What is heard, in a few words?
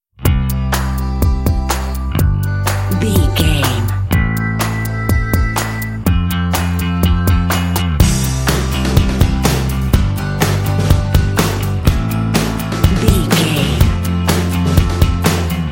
indie nights